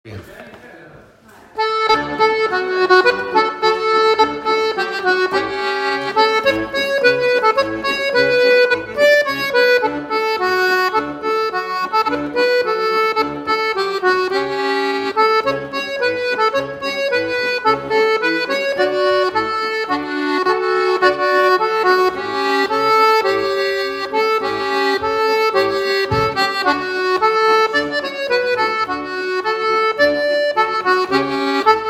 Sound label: Sternpolka